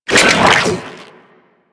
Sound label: Sniper Reload; DE; Warframe; Weapons; Sentient; Crp Sent Amlg Sniper